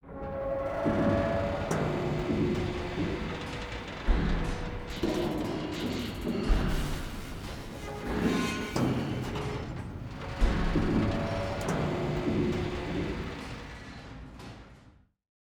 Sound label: ambient port; World Of Warships; clanbase